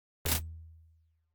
Gameover

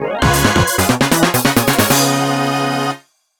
Race Start Fanfare (Battle); Mario Kart 7

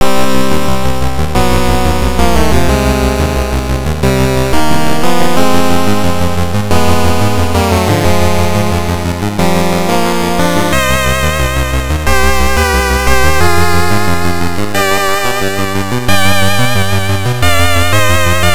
Cave BGM; Ghosts n Goblins; Gameboy

